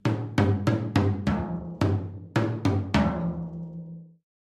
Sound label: Drums Toms A Accent, drums tom